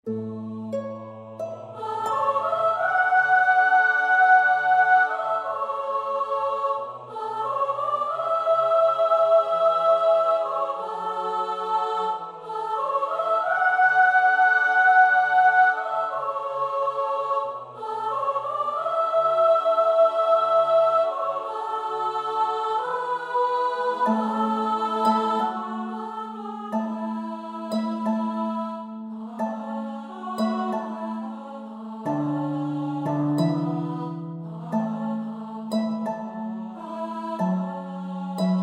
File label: Sop Hi